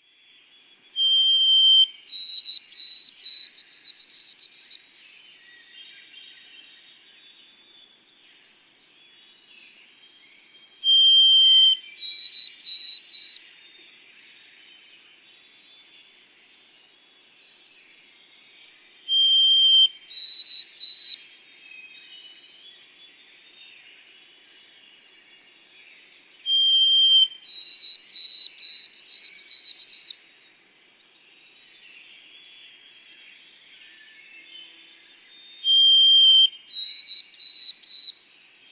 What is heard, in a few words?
White-throated Sparrow